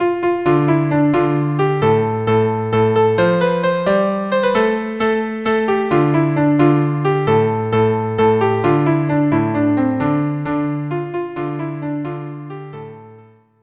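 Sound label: viola cello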